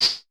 HH, Vermona Mono15 Drums; Stereo layers; Swordfight

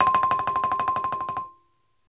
BALAFON

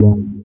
Elc Bass; Equipped Music

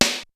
XR-10 K+SN+H, drums; AKAI; Rubber Chicken Software Sample Bank; DRUMS + PERC

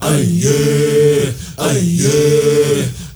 MALE, HUMMERS; Spectrasonics; Heart of Africa 2; M.HUMMERS

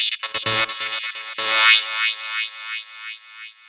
Nu Breakz, Underground UK House & Electro, Synths; Sonic Foundry (Sony Creative Software)

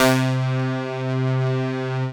NOOG BASS C3; Best Service Dream Experience

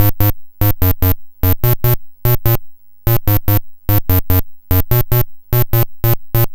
Apple II Bass, Chiptune

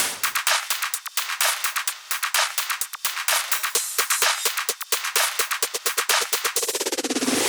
Drum Loop Full ohne BD; 128BPM; Multi Loops

